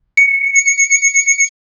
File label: boxing sound effect